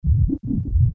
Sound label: additional deep bubbling